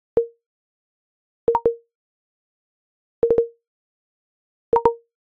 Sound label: Arcade Click Neutral; boom library; The Interface